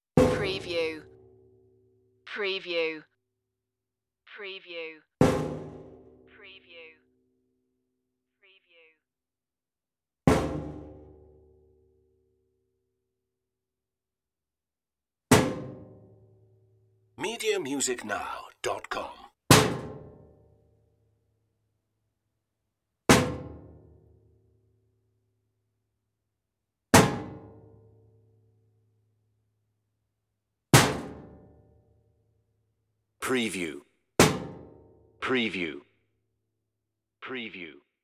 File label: sheet metal hits